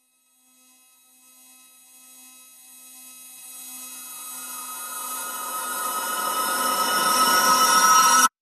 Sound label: Rise Mother Ship